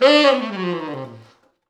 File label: T Sax falls, Horns Sax